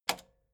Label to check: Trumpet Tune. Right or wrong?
Wrong